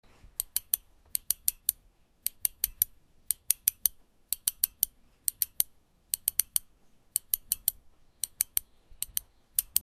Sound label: steady knitting needle, craft